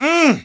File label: Mmmm